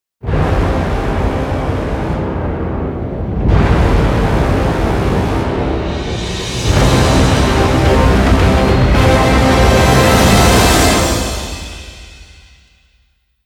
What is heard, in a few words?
Cutscene Boss Intro Orchestral Horror; Lords of the Fallen